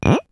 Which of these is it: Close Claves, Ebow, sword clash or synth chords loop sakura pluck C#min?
Close Claves